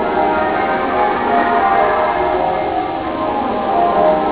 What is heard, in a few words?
muffled, bell recordings; grace